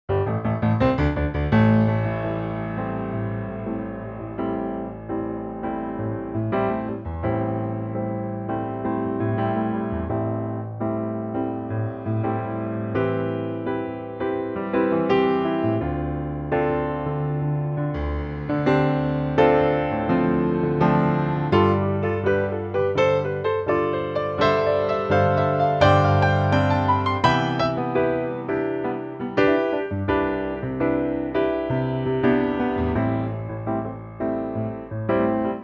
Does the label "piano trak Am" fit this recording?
Yes